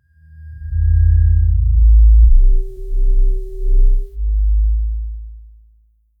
sci fi mission complete

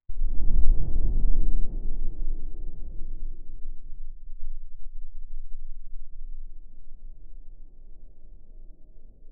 a sharp sound of a, brush

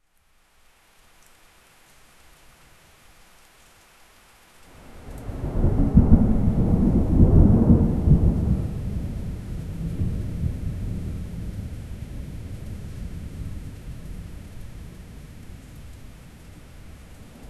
faraway thunder sound

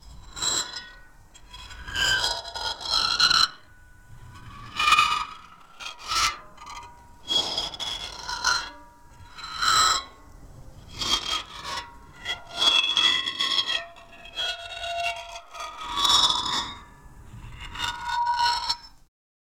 long dragging metallic; textures

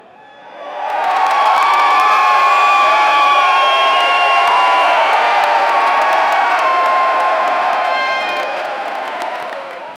a large live concert stad, concert

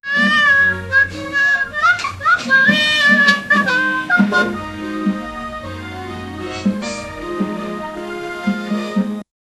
uplifting cheerful 1950s; japanese

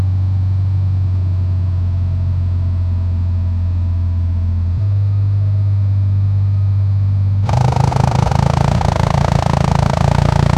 create a sound of an, truck

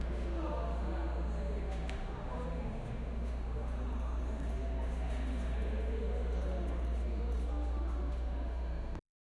school

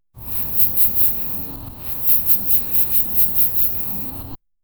a sticky squishy sound of

